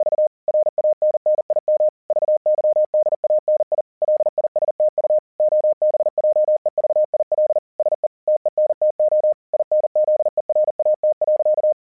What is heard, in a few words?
40wpm, cw; vyuka cw; hasek